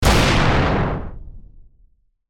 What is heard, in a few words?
Revolver Fire; RussianRoulette